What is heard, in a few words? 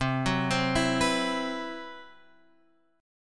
C Major 7th Flat 5th, Guitar Open F, chord; arpeggiated; CM7b5; x,7,6,7,7,6